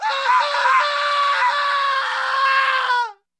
Scream Male, Terror, Human, Horror Scream Male, Agony, Human, Horror; Blind Drive